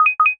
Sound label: metal detector beep x2